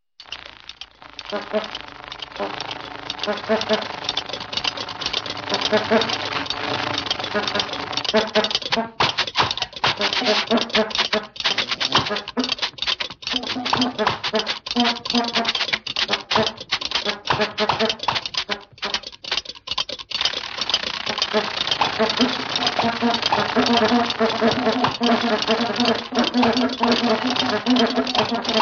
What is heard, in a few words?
Funny Metallic Clops